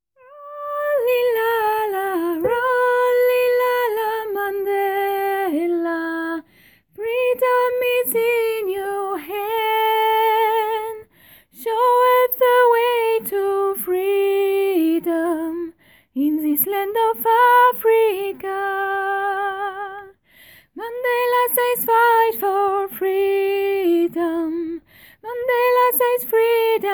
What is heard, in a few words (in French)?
Freedom sopranes